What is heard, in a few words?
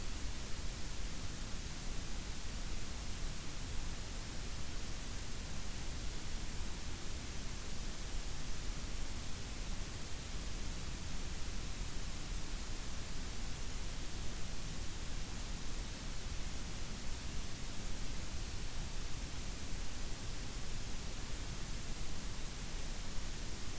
WFR audio emfisis